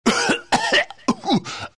cough; dota; batrider

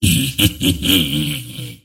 dismember laugh; pudge

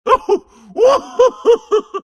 laugh; tusk

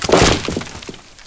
treant spawn; weapons; dota; furion

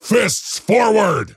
move pain; dota; axe

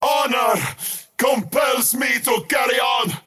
skywrath crown move pain; skywrath mage

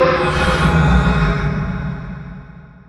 spell steal cast; weapons; dota; rubick

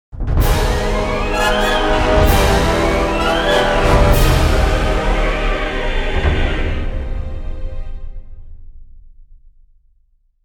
rosh death music, halloween stingers

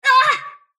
sylph pain; dota; dark willow

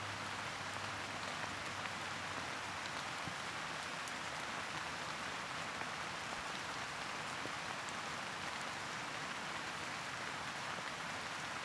light rain lp; ambient soundscapes; dota

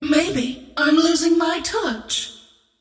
scream whiff; queenofpain

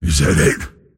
last hit pain; pudge

